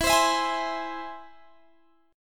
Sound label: E Augmented, Guitar, Open G